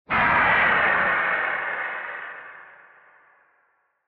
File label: Retro Anime Gun Low